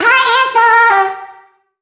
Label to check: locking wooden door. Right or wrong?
Wrong